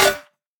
snare future

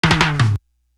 roll

